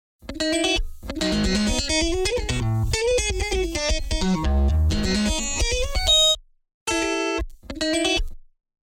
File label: felled riff raw